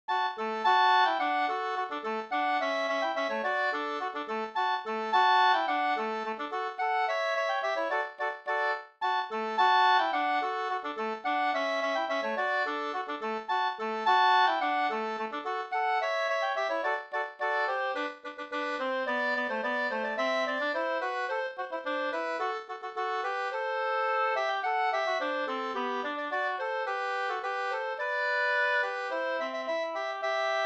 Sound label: polka